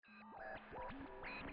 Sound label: waves, WALDORF Wave; Sound demo; Sound demo by Peter Georges; 1993; Germany